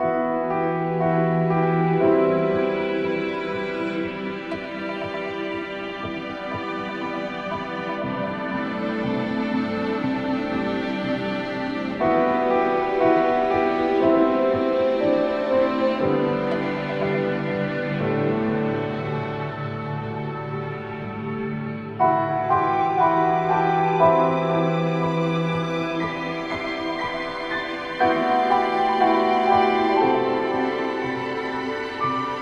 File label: Sadness Ambient